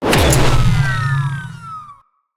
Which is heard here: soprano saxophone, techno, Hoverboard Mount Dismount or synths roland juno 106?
Hoverboard Mount Dismount